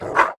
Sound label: enemy fire fox melee